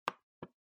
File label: Trackpad Click R